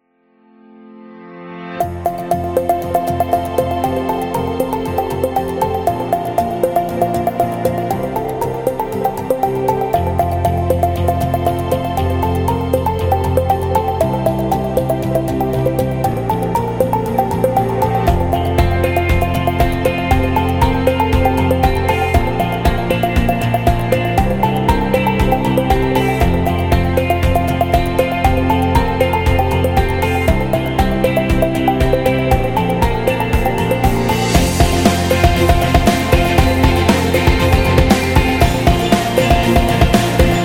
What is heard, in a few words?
Fitness Motivational, corporate music; corporate music vol 18